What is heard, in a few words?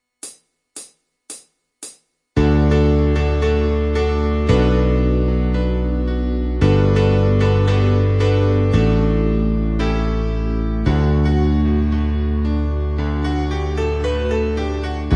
cover piano